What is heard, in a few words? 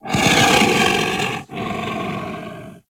Feral Vocal Breath Walk; Warframe; Kubrow